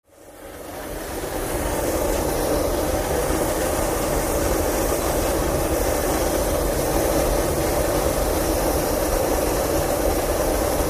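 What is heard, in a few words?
Steam Boiler With Gas Hiss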